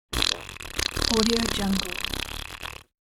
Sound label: a heavy and upsetting fart; sound ideas